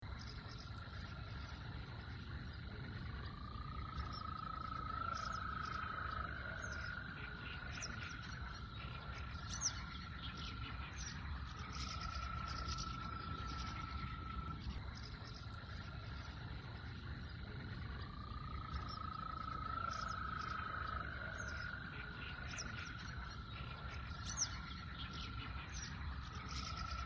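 evening birdsong and frogs croaking in Mykolaiv region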